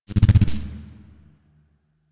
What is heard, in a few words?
Corruption Pickup Hostile Large Deactivate; Warframe; Missions; Zariman